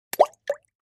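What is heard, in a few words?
Splash Small Plop Plastic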